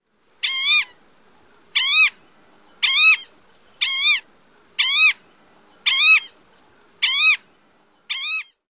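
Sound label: Green-tailed Towhee, calls number 1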